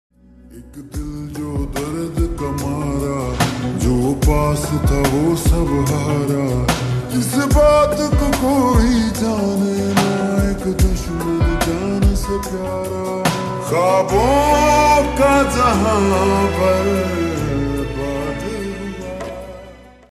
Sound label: Slowed Reverb Ringtone